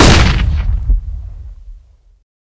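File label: Weapons Flashbang Explode; Wepons; Counter-Strike: Global Offensive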